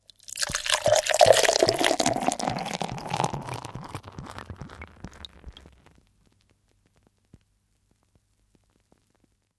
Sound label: beer, sizzle